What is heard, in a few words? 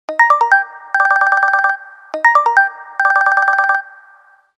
Basic Tone; Samsung; SGH-A697 Sunburst